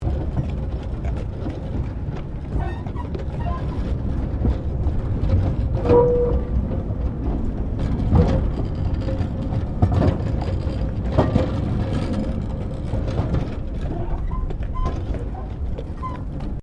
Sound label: Tram Horse Drawn Carriage Rolling; Horse drawn tramcar; Carriage Sound Effects; Vehicles